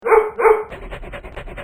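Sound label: Bark Coo, cucù con gli animali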